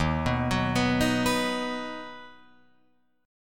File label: Eb Minor Sharp 5th Guitar Open F; Ebm#5 arpeggiated; JGuitar